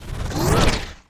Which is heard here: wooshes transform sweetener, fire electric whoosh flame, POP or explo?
wooshes transform sweetener